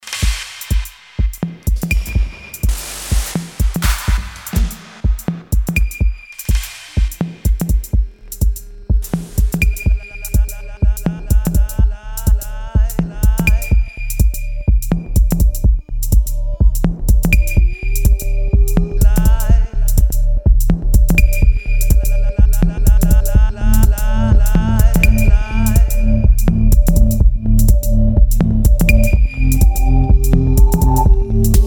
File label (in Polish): Mikro Techno